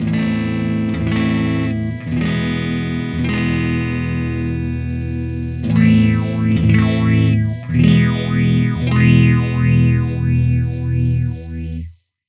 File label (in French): effet phaser